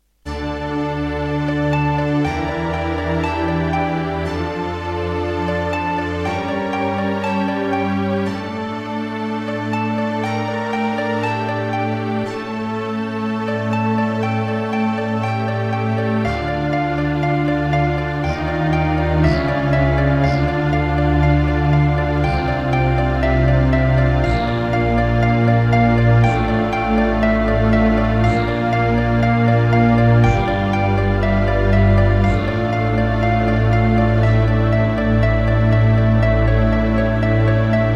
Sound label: Terratec MiniWaveSystem TTWT; Blood